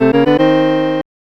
Important Item; Zelda no Densetsu; SNES

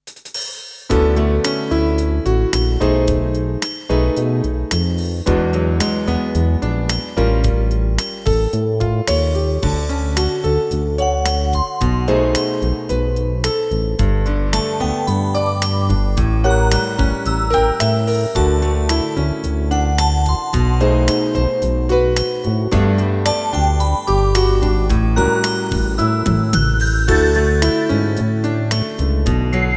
Gentle Wind; Happy Lesson Music Collection

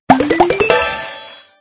Box blow

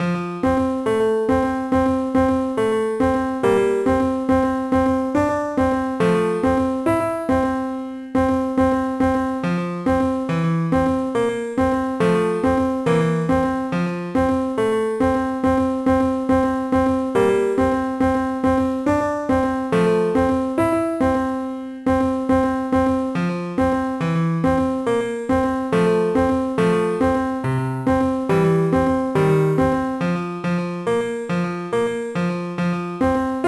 BGM PIANO

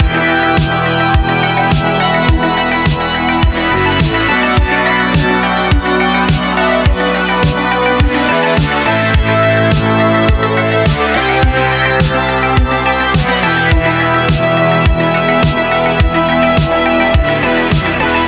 Horn Path Loop 105bpm; Furi; Windows gamerip 2016

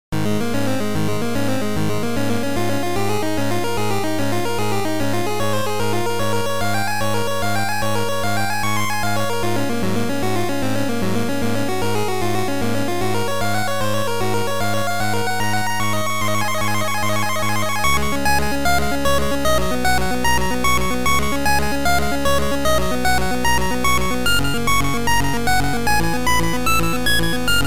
Continue; Hero Shuugou Pinball Party; GB

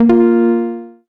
Text Feedback

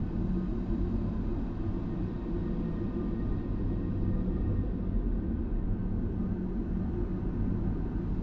amb portal

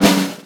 high wire snare start; Highwire Jump Rope; high wire snare start.wav

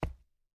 Footsteps Ruins; Main Character

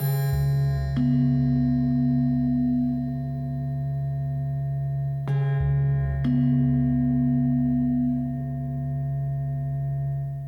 Gamelan Tabla Tambura; grom; terror in tibet

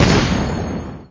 Bricks

